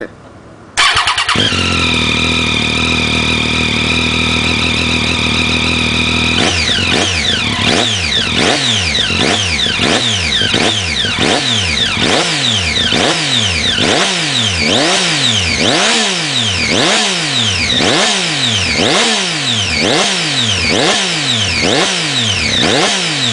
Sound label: Triumph Street Triple